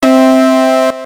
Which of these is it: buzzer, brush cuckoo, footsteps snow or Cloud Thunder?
buzzer